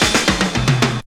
Fills, Roland; Sound & Vision - Gigapack I; Perc

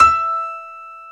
PNO, SY PIANO, House

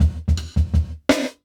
Jungle Breaks

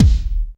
DX MIX BD, DRUM; AKAI S6000 CD-ROM - Volume 1

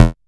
bass stabs; FutureRetro FR777

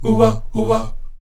Oh-Wa Oh-Wa, Afro Chants; Roland LCDP11 Africa VOL-1

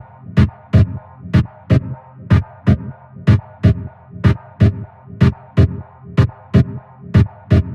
124 Fm Dub, Melodic Loops; M8; Electronisounds

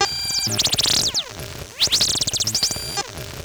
Pro One Loop, Keyboards

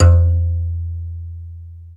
Bass Kalimba F1

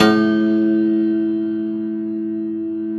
Rubbery Piano, prepared piano; Total Piano; Rubbery Piano 2 +ped

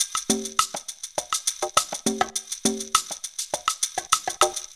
PERC LOOP 102, funky elements; Zero-G

